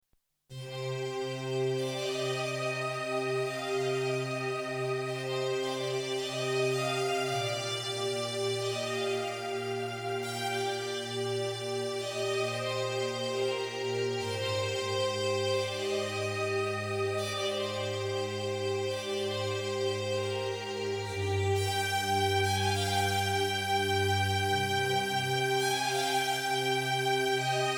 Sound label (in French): Violon; Mort Marraine